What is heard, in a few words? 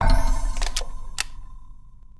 Build English Redcoat; Civ4 warlords